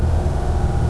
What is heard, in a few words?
space; aggress